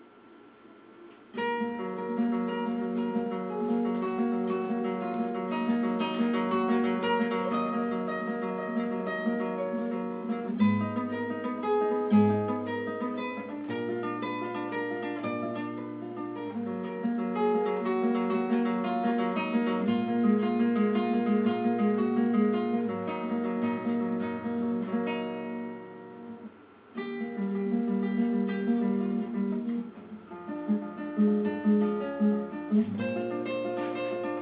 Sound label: guitar